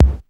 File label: BD, COMPU-DRUMS; Crypton - DrumBase5000